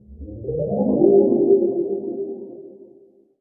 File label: FLOAT TANK; ILIO; Trance Fusion